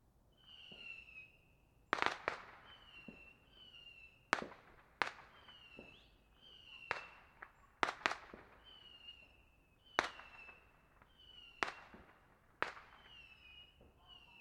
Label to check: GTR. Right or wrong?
Wrong